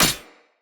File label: Short Sword Medium Impact Armour; Weapon Impacts; Player; Swords; Combat